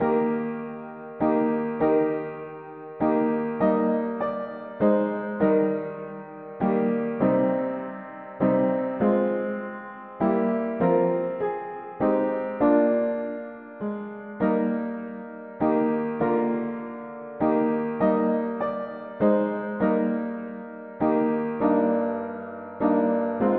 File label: mono piano, hymns; May the Saviour s love and merit